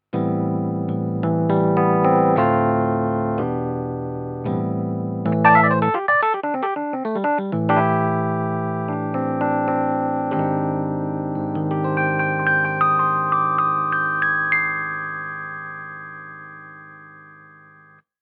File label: Roland Rhodes